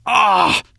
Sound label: Wound Crit; Prince Valanar